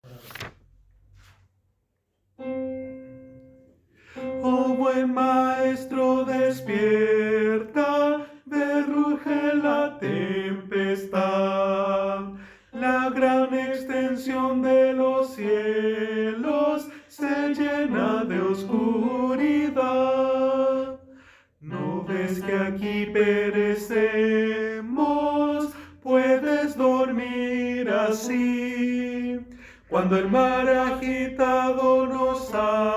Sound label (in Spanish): Tenor, coro; Oh buen maestro despierta